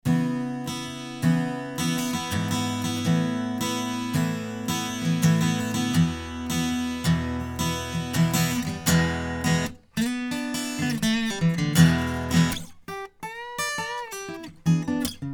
Facets Ac Gtr Frets

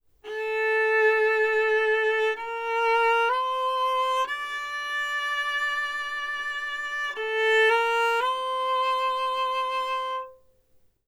Viola 4th Position

